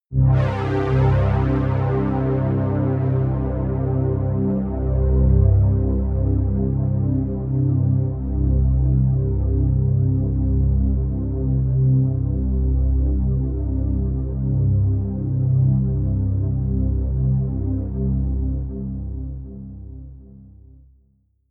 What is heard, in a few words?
pad sweep flanger; flaechen